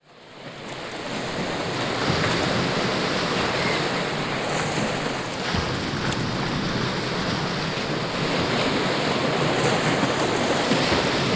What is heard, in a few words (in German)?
Ocean Sounds, Am Meer, Meeresrauschen; Largo